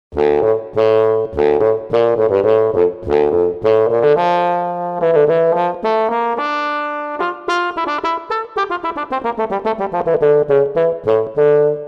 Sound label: Bassoon; TURBO VL